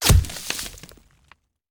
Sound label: Bullet Impact; Sound Ideas; Guns